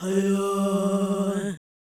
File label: Fem Vocal 128bpm G FX, African Voices